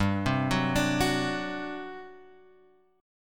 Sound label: G Major 7th Suspended 4th, G, down, Guitar, Open G